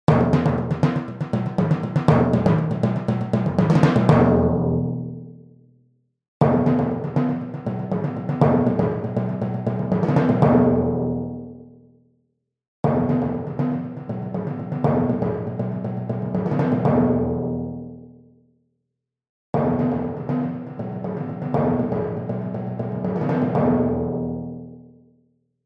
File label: timpani close depth; solo piano